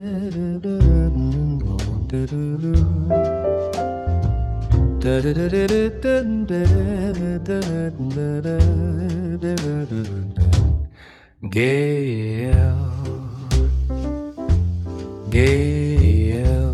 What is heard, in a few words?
jazz